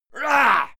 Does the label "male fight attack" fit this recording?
Yes